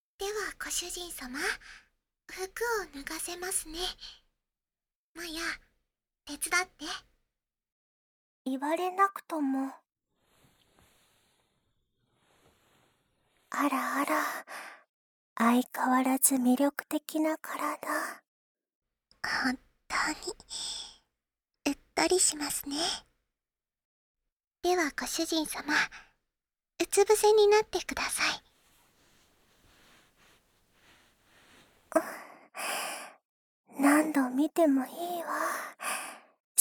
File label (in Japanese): 体のオイルマッサージ, ASMR